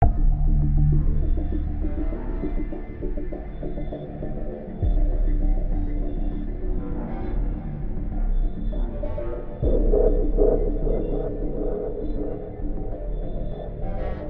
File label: noBass